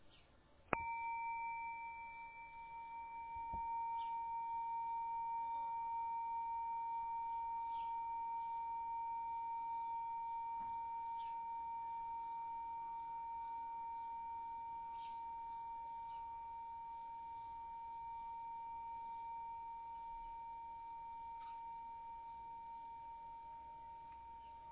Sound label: bronze bowl, singing bowls